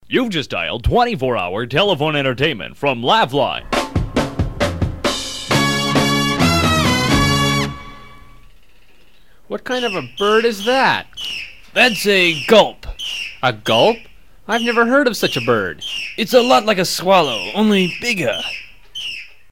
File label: Gulp